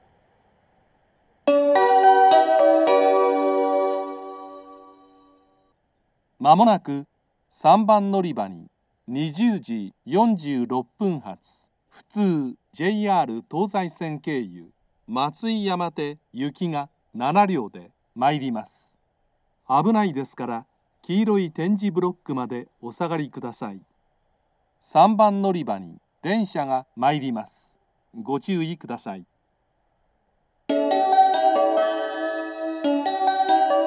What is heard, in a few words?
motomachi local matsuiyamate; station